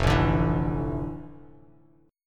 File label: F Major 13th Bass Standard; strummed; JGuitar